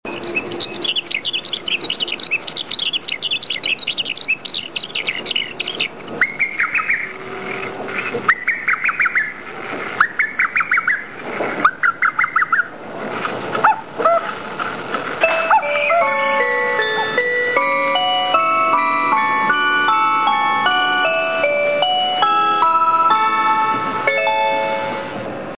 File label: train chime; 雷鳥; ４８５系のチャイムです。